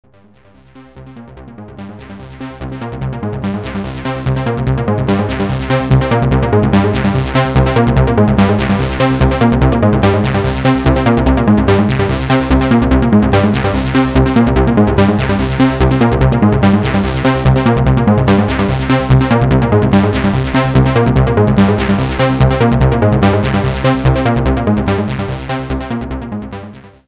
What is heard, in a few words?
arp